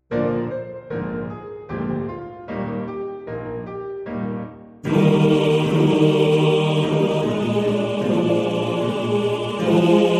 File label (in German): Chor; Santa Lucia